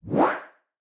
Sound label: menu open